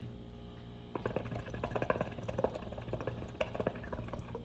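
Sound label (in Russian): кальян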